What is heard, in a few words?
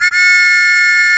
whistle